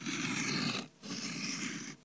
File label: chalk; kanagram; tdeedu